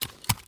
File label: Pistol Fall On Dirt, weapons; AdobeCC